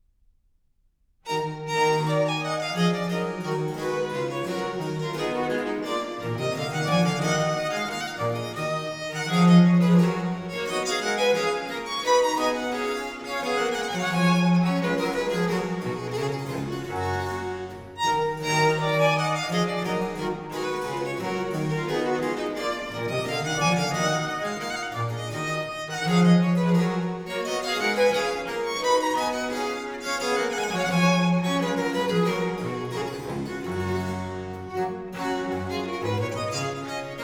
Sonata in E minor, Allemande. Andante Allegro, Trio Sonatas; Handel